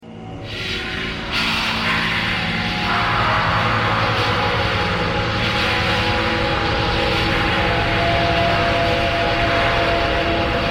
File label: thread pentatonic